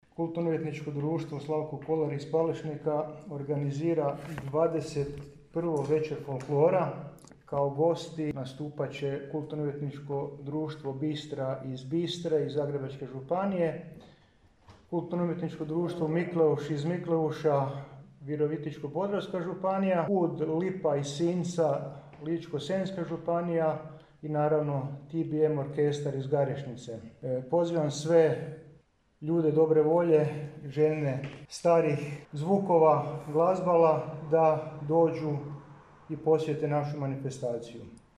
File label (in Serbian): vecer folklora; u palesniku sutra 21 vecer folklora